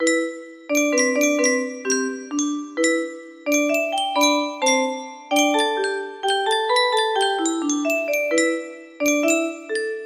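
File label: duet version, music box melody; adventure time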